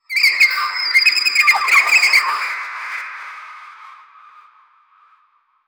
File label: mechanical cybernetic bir, robotic